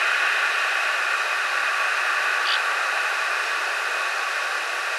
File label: DICKCISSEL; Dickcissels - Etna, NY; nfc